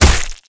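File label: Damage Bow Bone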